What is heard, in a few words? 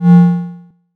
force Field; Kenney's Sound Pack; Sci-Fi Sounds